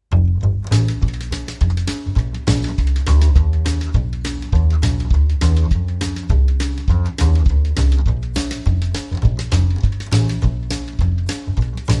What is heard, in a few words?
Down Home Bass